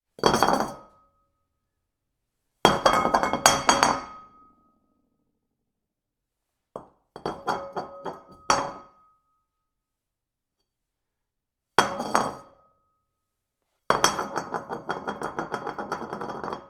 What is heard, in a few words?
porcelain bowl drop short series